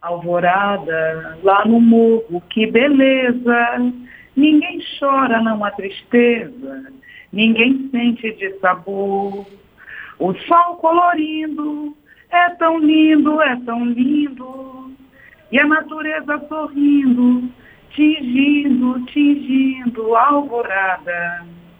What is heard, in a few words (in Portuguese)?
CANTANDO